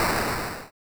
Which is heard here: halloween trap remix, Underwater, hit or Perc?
hit